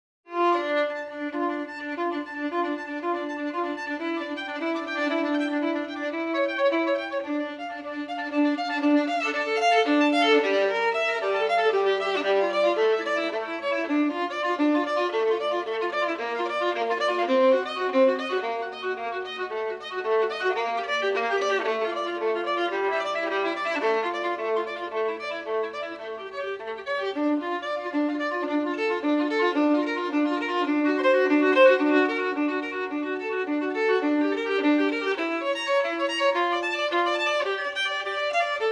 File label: Musical Interleaved Melodies